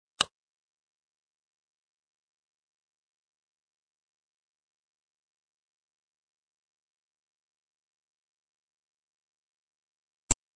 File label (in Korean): Fights, 싸움-전투; 헐리웃특수효과음